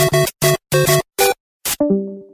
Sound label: beedoo normalized; super mario